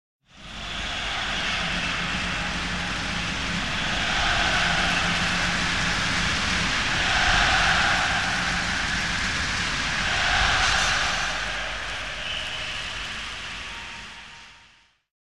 Crowd Rhythmic Shouting And Light Clapping - Very Large Sports Stadium Crowd; Hollywood Edge; sound designer's toolkit v2